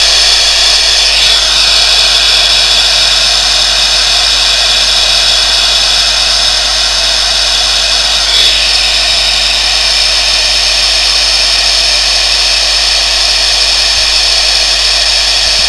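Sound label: stationary, train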